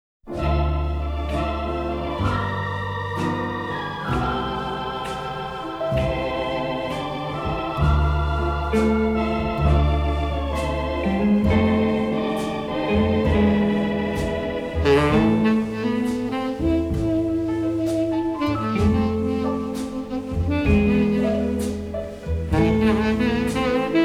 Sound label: blues, saksofon; Саксофон